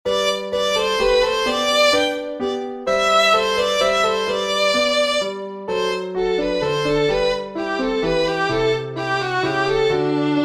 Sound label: violin and piano; Minuet in G